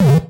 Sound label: Bump; SMB3